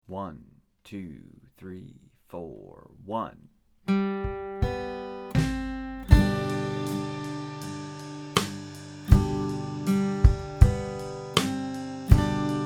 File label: When Then Saints Go Marching Key of G 80BPM